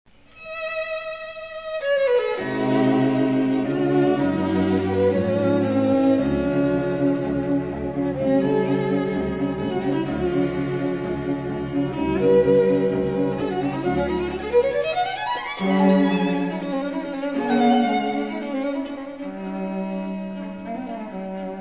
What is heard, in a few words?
String Quartet in D major; Haydn; Opus 50 No 6; (Frog)